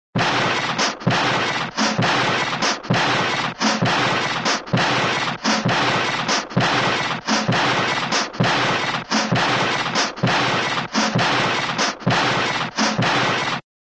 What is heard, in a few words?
pile driver, cartoon